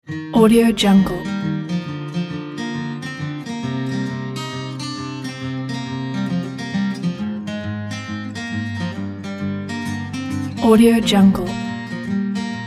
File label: cheerful folky jingle; cooperhydemusic